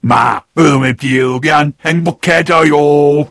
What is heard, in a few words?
sniper laugh short